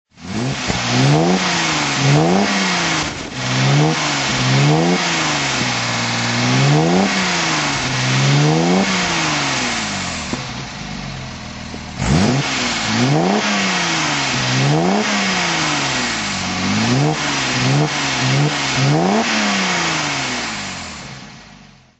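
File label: mercedes benz 280sl pagoda auto throttle; Mercedes-Benz 280 SL 'Pagoda', 1968; Engine sound; V3D/ ClassicarImages; Marc Vorgers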